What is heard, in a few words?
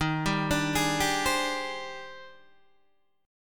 D sharp Major 13th D sharp Guitar DADGAD, chord; chordshape; 13,10,12,13,10,10